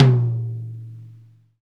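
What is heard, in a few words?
tom mid, Roland; Club-50 - Foundations Roland; Ext.Snare 1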